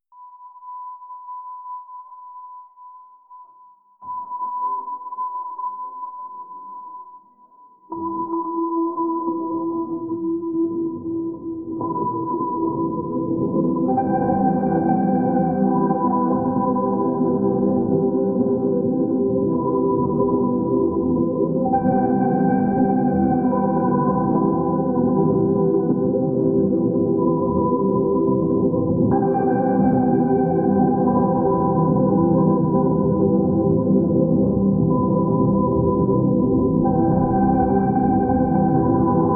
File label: ambient; vladik brutal